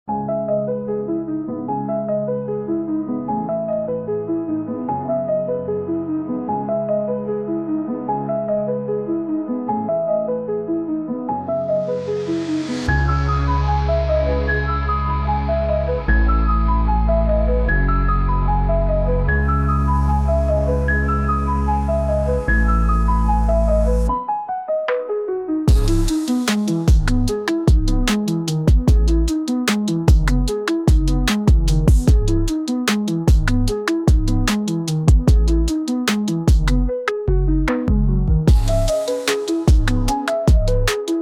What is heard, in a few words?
trap beat